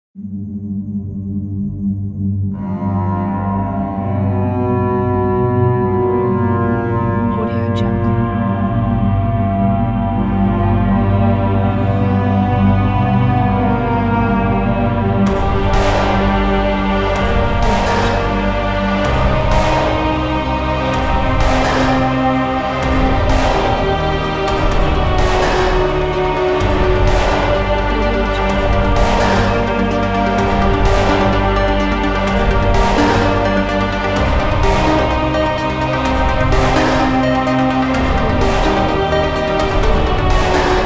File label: dark action theme